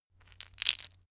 stone gravel clatter short; natur-tiere; steine

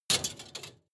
metal impact scrap metal bike; hits explosionen; metall

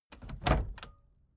case lock close soft; objekte; tueren

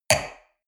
wine glass place table medium short; berufe; soundpool

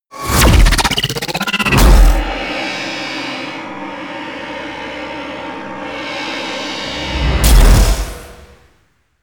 promo pad; music elements

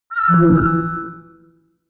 notification sci fi accept; kommunikation; signale

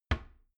door wood close soft

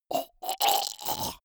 monster choking zombie short; comedy-cartoon; soundpool

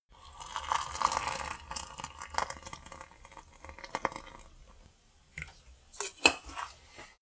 brew tea pot, kitchen; haus-garten; kueche

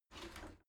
shower slide door slide short open

